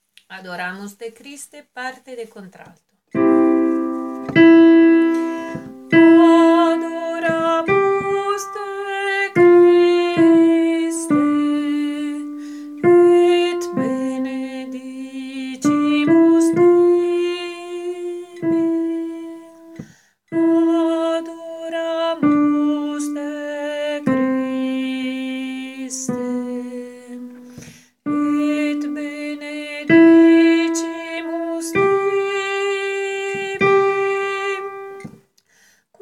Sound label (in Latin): Adoramus te Christe Alto